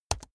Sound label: keyboard laptop space press fast hard